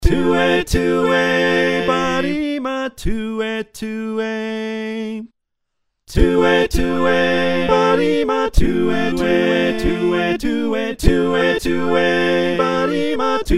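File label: soprano alto tenor bass, choir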